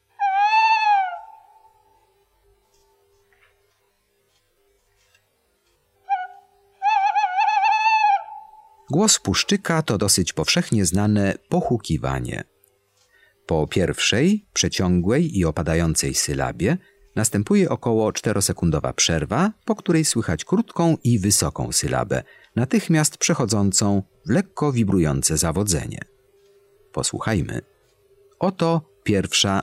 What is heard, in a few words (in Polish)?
PUSZCZYK, Ptaki; Kurs PTTK